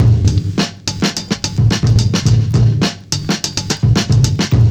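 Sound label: TUNE THE bass drum, LOOP; Univers Sons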